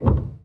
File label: piano release; samples-piano